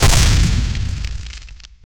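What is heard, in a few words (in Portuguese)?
sequência de tiros de canhão; cannon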